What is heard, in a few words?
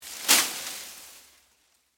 Foliage Hit, With, Machete, Clear, Brush, Palm, Frond; Texture; Rustling Sound Effects; BlastWave